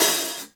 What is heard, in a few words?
Cassette Open Hihat; Lofi; Hihats - Open